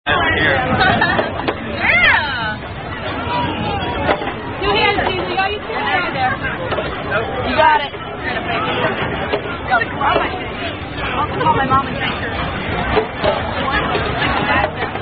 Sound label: Diesel Flip Cup Machine, Drinking Game